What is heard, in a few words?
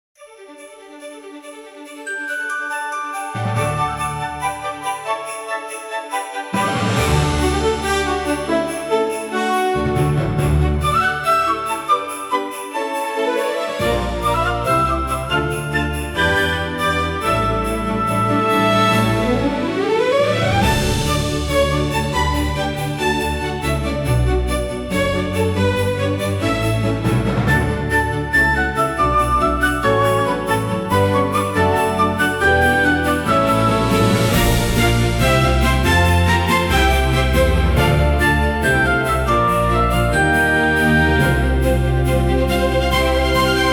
Sleigh Bell Sprint; home alone in concert with the nashville symphony